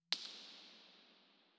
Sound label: IR sweep BIN; Bergamo; EAW100; IMP-RESP